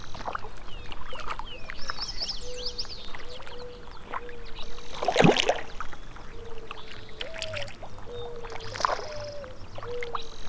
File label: lake